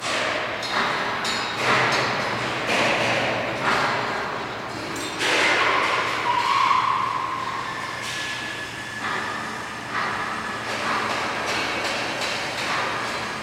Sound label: giant robot factory